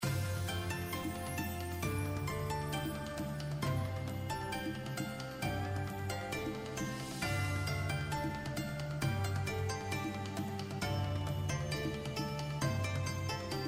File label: BGM Super Wheel, Chinese New Year; Chinese New Year Deluxe